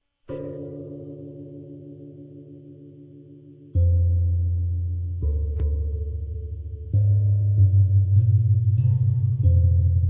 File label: whaledrum